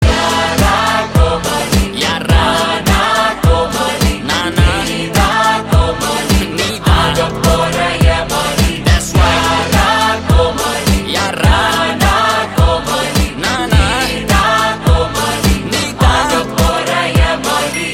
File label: Humming Ringtone